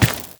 run stone

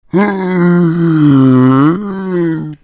Pig Yawn; Angry Birds Space

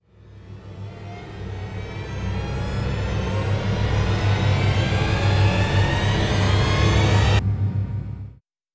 Missile Launch; Homeworld Deserts of Kharak; Gaalsi

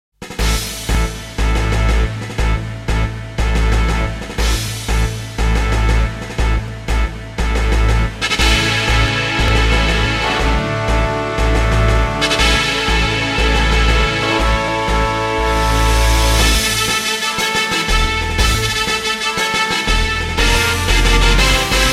Battle Victory; cho aniki otoko no tamafuda otoko no tamashii

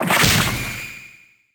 punch

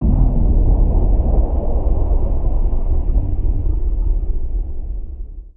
Atmos; In the Line of Duty Firefighter

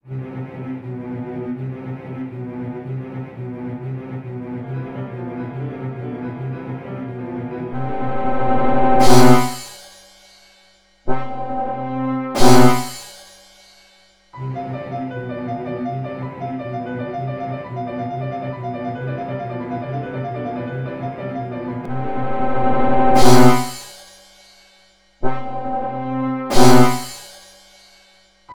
High Tension; katawa shoujo beta version gamerip

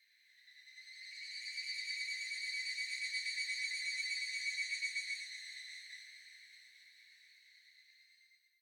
Ambience Cicada; The Inn

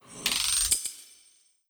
amendment progress

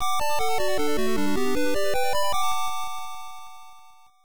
Fanfare; Sorcerian Additional Scenario Vol. 3; Pyramid; Sharp X1 turbo

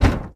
Wood object impact; this is the zodiac speaking 2020 gamerip switch ps4 xbox one pc macos

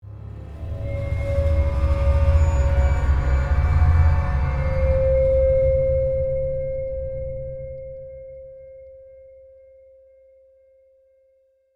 Tense (Stinger)

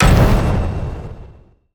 Impact; Antihero

